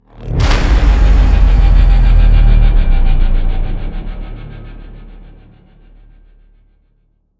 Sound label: Stinger Modulate